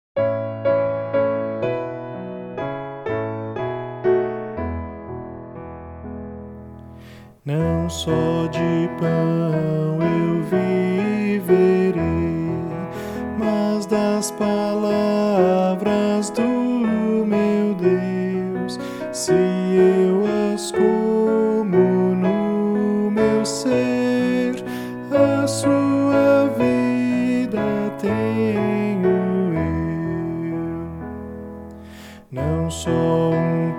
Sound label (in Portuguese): voz piano